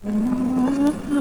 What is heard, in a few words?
vinylize; master; sound kit; nova sound